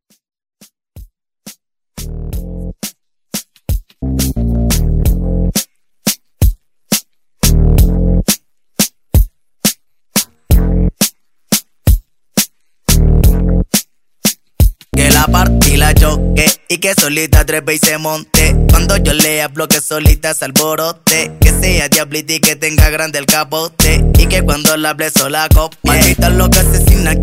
Latin Beat Intro

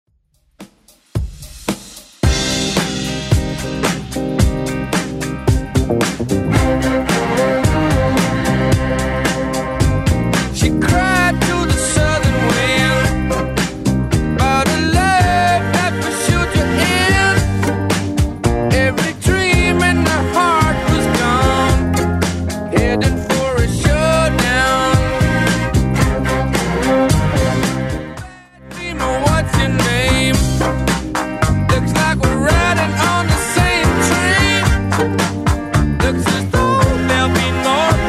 70s Rock 111bpm; Redrum

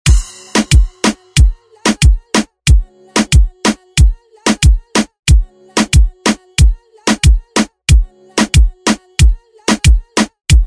re drum

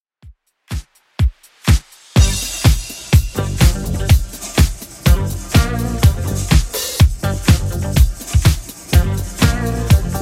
70s Redrum 124bpm

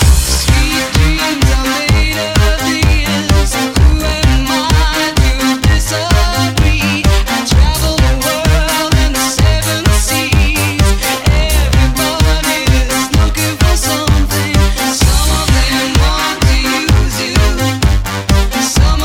REDRUM 128bpm Cm

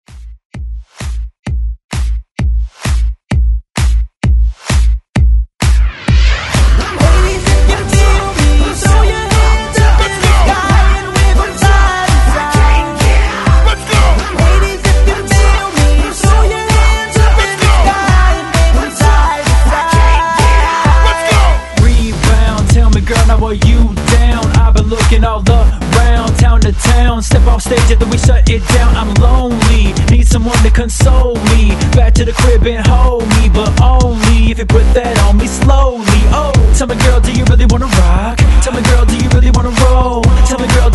Hype ReDrum 130 Bpm; remixplanet

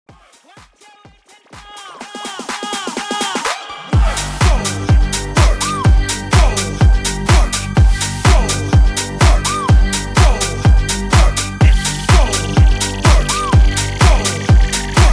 HYPE 125 BPM

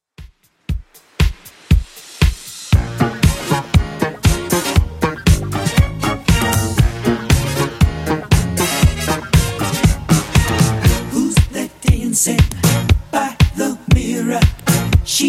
70s 118bpm

